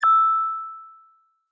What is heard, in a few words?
Charging, AirPods Sounds, Case